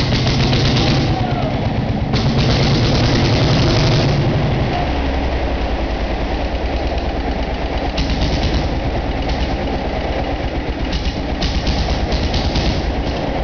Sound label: starting